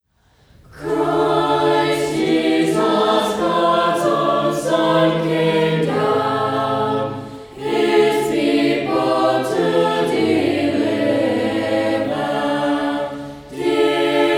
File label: Christ Jesus Lay in Death's Strong Bands Kammerchor; Cruger